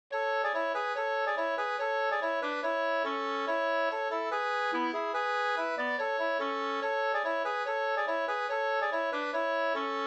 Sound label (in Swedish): reinlender